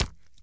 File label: BULLET INTO FLESH